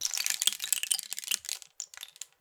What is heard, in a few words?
Rain Stick, Percussion Samples